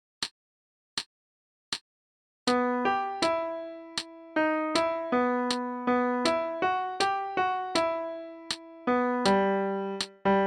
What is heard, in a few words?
alto sax long etude; all state